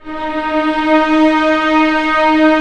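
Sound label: strings D#4 PP L; Sound On Sound - Peter Siedleczek's Orchestra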